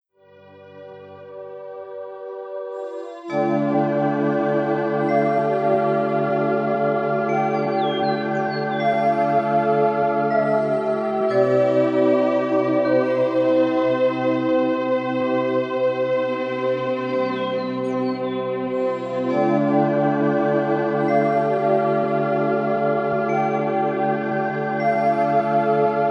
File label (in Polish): muzyczny miks relaksacyjny, 432 hz